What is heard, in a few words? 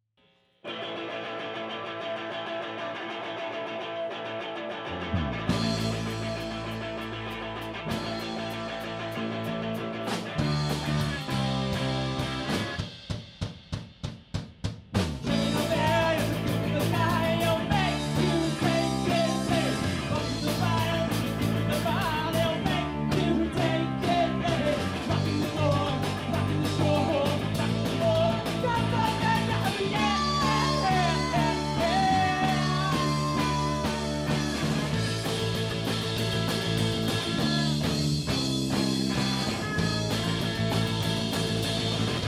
with bass